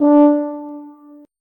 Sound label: tuba note